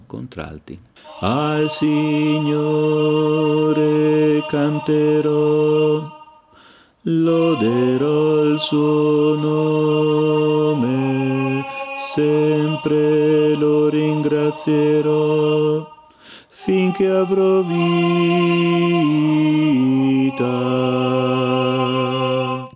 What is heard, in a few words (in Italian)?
Contralti